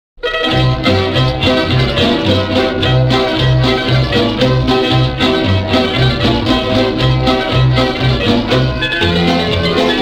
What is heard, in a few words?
kolo instrumental